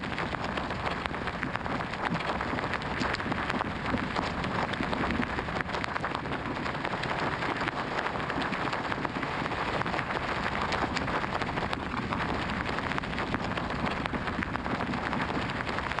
EARTH MID; SIMUZENSOUND

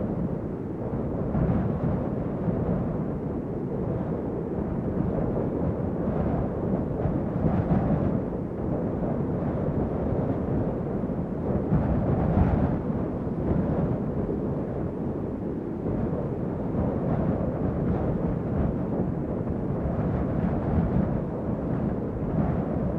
WIND HEAVY; YBR125

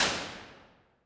Cavern, Reverb; iZotope Hybrid Reverb

